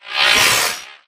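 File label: Drone Movement Turn; Titanfall 2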